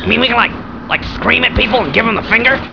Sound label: scream